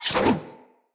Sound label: dagger throw whoosh; Weapons; Enemy; Daggers; Sinecure Dagger